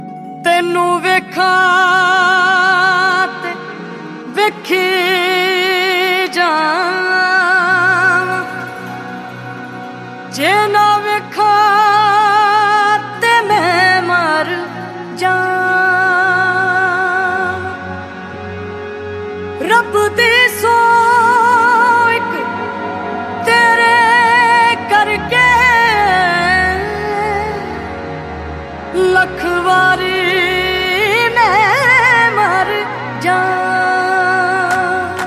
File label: Punjabi Love Song Ringtone